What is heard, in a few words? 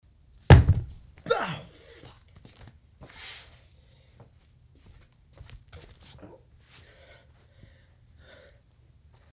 Dumbells